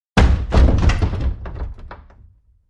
Large Chest Dropped

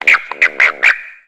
Frog Tree Ribbits

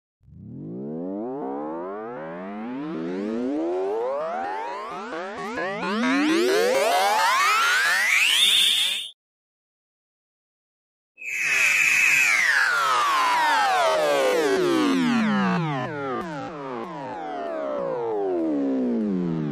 Rising Multi Tones, synth tones

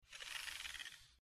Short Squeaky Mouse

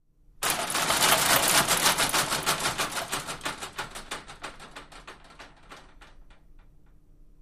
Door Accordion Sliding Metal; Shake 3x; Metal Gate

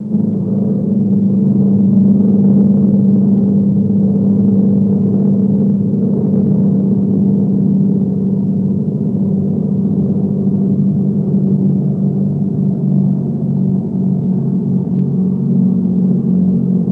B-29 Bomber Steady Drone, Looped Mid To End

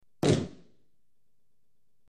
Gavel Impacts Dull

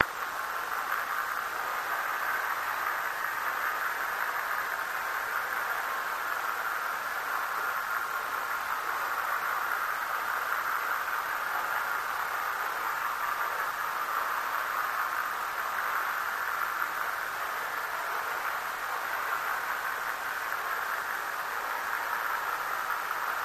Tractor Beam Hvy Noise; air

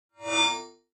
Mouse Over HF Elect, mouse over

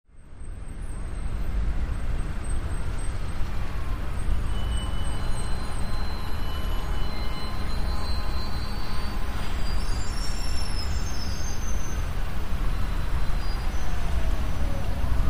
Heavy Traffic Jam, big city

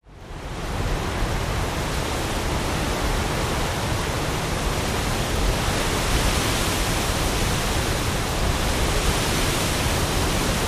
storm, rainfall

